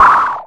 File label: Percussive, Drums Perc One Shots